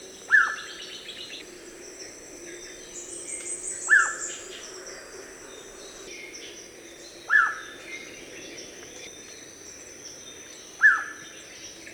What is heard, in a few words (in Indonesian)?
paok